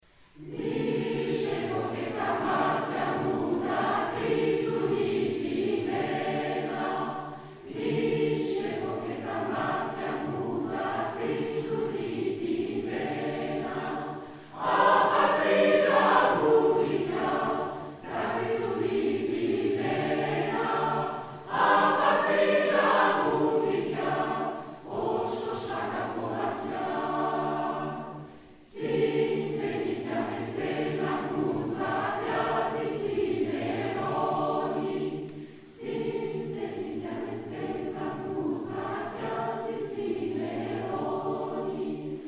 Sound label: Syrtos Rhodou